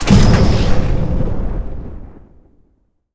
Sci-Fi Explosion

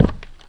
Foot Step Orc

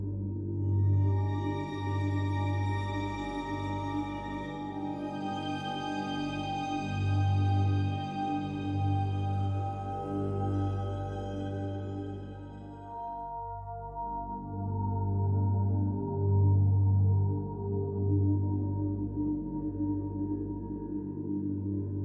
music death loop; melisandre; Reigns Game of Thrones

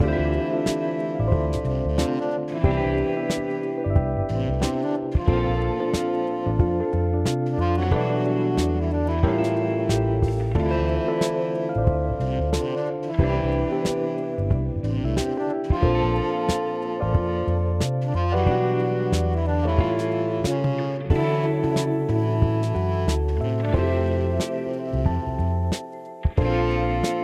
Beats Chill

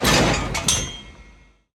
Gunsmith Upgrade

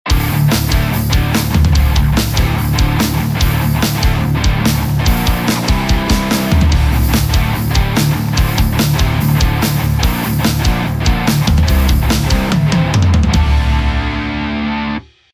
Loop, 145 bpm; Mars Sortie

